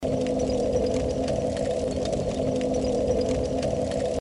Bonfire; Dark Souls

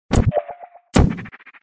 Portal Gun

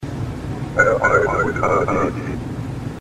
Loudspeaker; Papers Please

